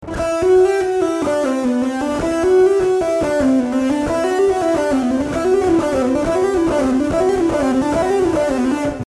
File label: legato